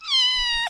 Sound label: meow; cat